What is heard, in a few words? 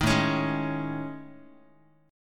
A Major 7th Flat 5th, down Guitar; AM7b5; strummed; 6,5,4,6,x,x